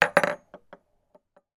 foley lightbulb down on table and rock side to side; zapsplat